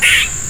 squirrel hurt

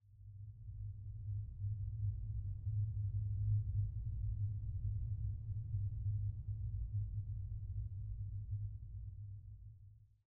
cave hit; matmos hl; atmosphere

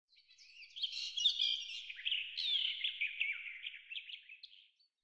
coast bird; matmos hl

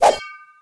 sword attack; matmos interactions

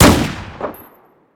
Desert Eagle Shoot; Modern Weapons Pack

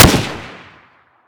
G36 Shoot; Modern Weapons Pack

